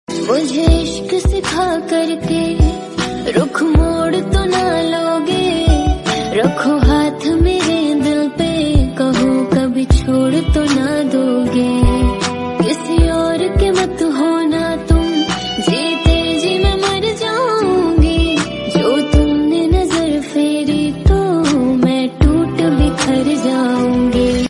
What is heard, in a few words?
Female Song Ringtone